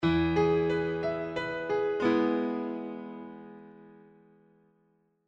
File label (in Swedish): Tonartsgivare E